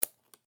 Metallverschluss oeffnen

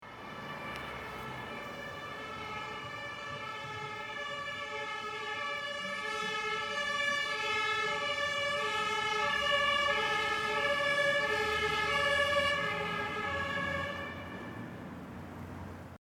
Silvester Martinshorn

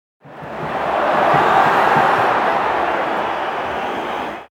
Stadion Atmosphaere

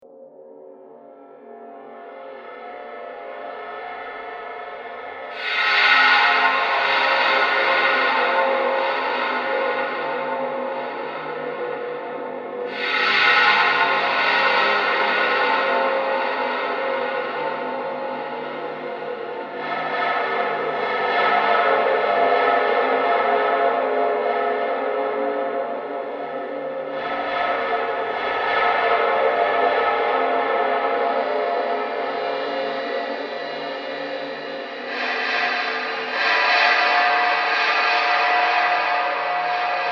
Vorhaengeschloss

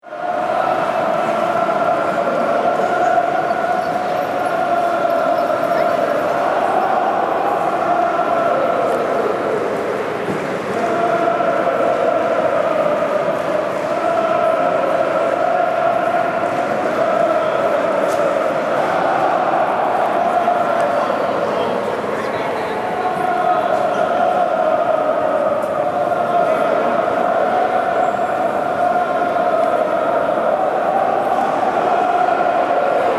Fans im Bahnhof

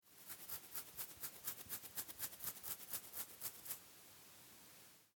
Scheuern

